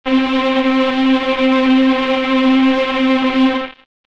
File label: Guitar